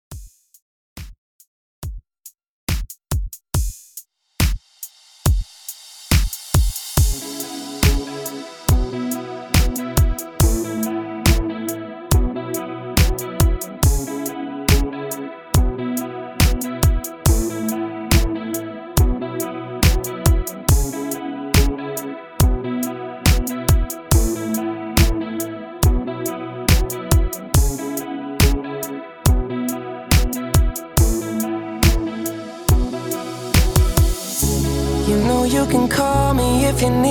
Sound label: Top 40 Redrum 70 BPM